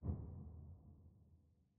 Easter Egg Click; Eggs

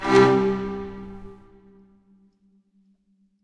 music puzzle note 05 down

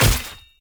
attack light; bloom minigame

